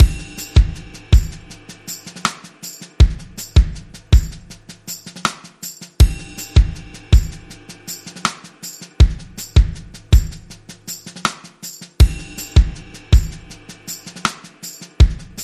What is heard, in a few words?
Drums